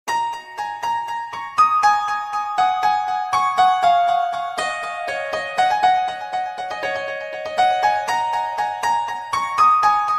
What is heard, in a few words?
NOKIA LUMIA 3D TONE RINGTONE